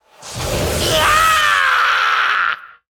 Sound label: Rocket Launcher Attack